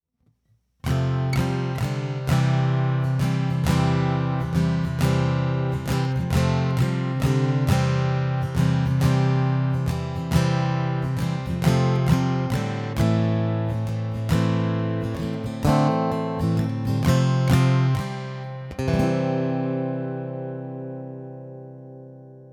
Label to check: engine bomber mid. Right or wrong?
Wrong